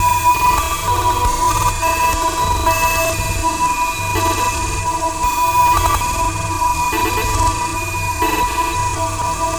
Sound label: Fuzzy, Tonal Atmospheres; Sonic Foundry (Sony Creative Software); Myths of Technology; Fuzzy Sputnik